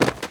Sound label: end move; RuneWar